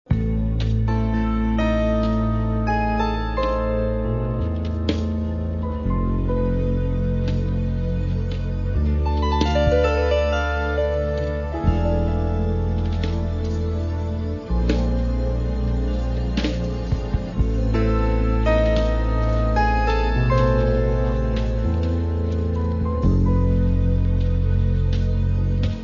jazz

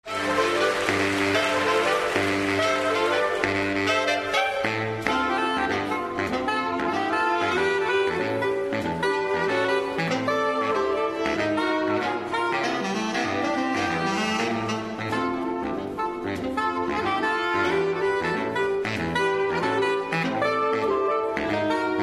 sax quartet swing; kyiv

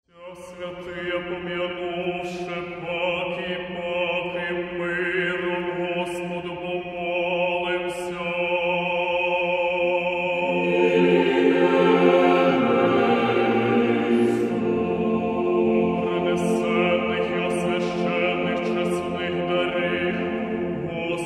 Choir Liturhia; Kyiv

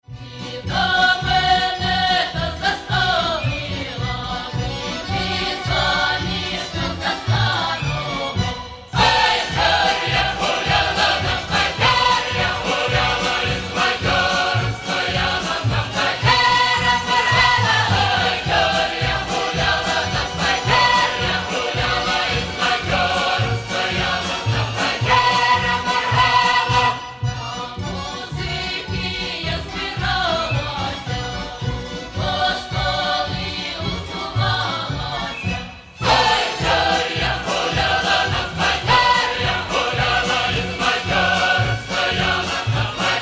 Folk Choir; Volyn

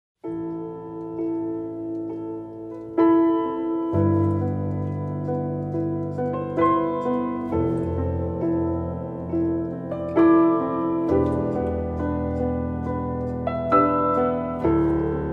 Piano

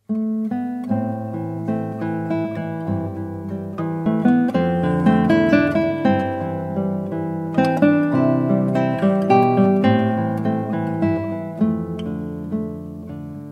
Romantic Guitar

